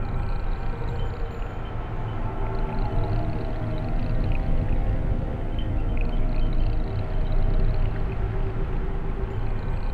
Tense Loop, Sympho; Cabela's Dangerous Hunts 2013; PS3; Wii; Xbox 360

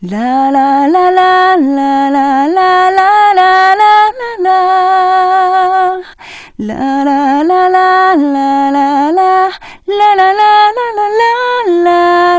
Ballade Vocal; Phantasy Star Online 2; PS Vita

